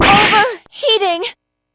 overheating; aigis; p4u

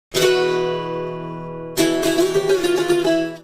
tune up

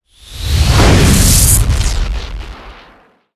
start; thoughtroute

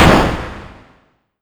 dramatic dun

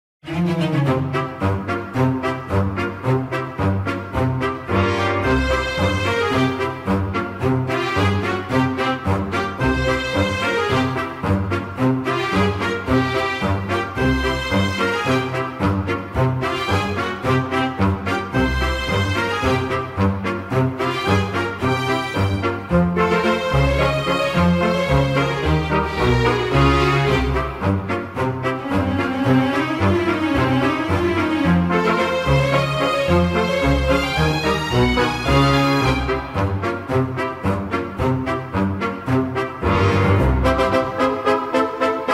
funny footsteps

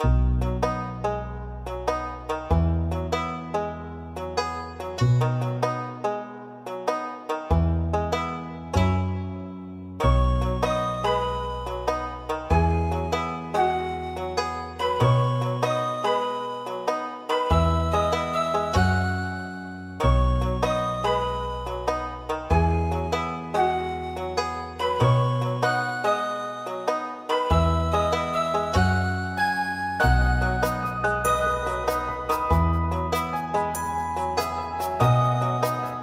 ambiance cloud country

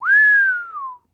whistle; teruteru

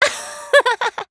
laugh; koizumi

